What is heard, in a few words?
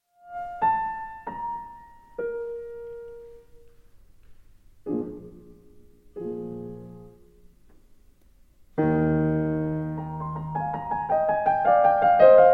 piano sonata in b flat major k281; wolfgang amadeus mozart